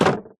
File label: close, fence gate; Minecraft; block